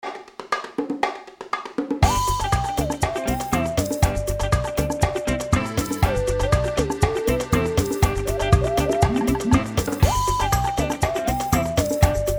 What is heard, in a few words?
whimsy melody and beat